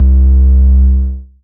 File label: Bass; M8; Electronisounds; Enigma Dub Cube One Shots